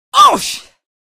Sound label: pain, female; episodic; episode 1; Source engine